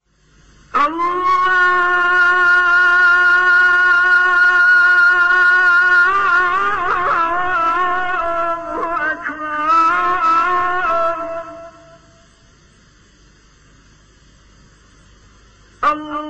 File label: azan ringtone